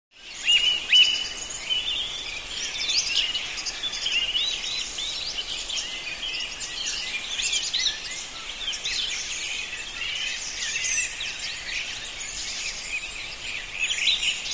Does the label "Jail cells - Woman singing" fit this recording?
No